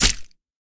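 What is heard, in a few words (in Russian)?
сабля нашла свою жертву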